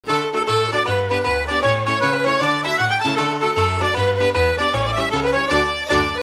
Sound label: slide; CASHEL SET